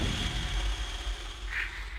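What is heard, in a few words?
Music Volcano Light 120; from dust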